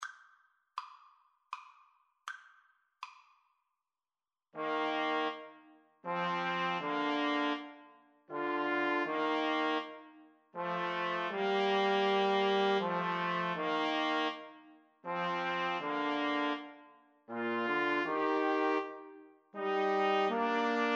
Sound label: 2 Trumpets Trombone